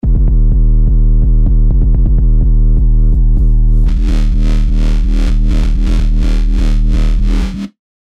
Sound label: 808 Enrichment; hard bass; revealed serum 2 hard bass meltdown